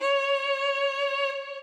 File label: solo